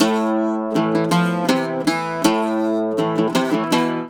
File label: Celtic Flavours; USB Soundscan vol.42; AKAI